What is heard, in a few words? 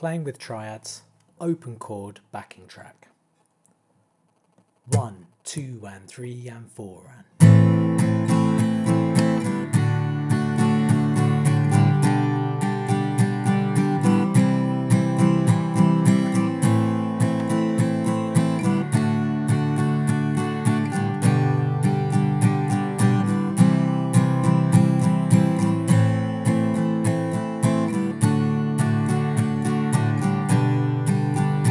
Playing With Triads Am D G C